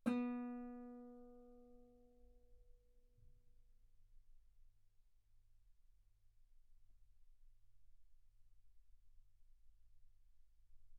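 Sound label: Guitar ff B string B3; sul B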